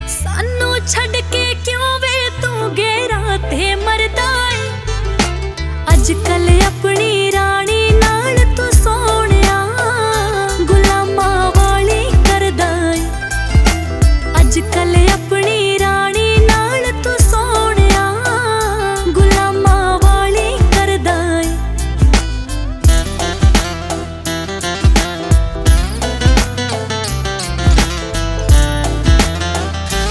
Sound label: Song Heart Touching